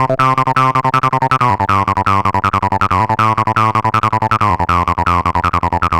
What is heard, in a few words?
Hardcore Loops Acid Loops, Dance Synths, 160; Best Service ProSamples vol.37